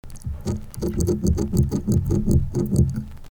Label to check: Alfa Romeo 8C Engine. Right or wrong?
Wrong